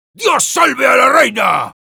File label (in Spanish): battlecry, es; Sniper